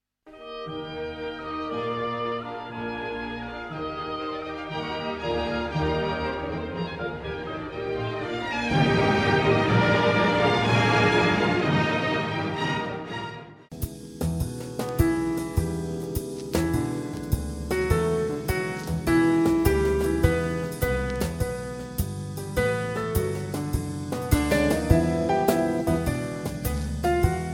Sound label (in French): identite sonore enregistrement; dominante